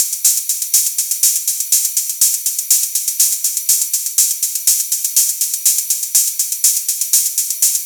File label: 122 BPM Ride; mmb studio soundtrack essentials